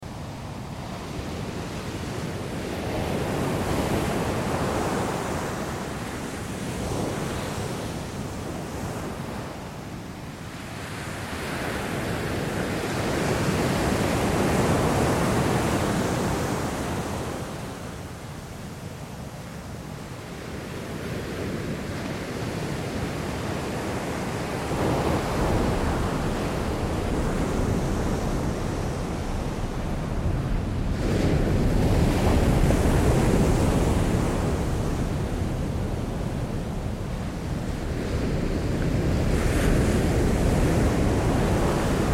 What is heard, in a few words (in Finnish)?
taustaluuppi meri; kohtaamisia kaliforniassa